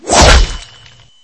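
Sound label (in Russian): сильный замах и разрез пополам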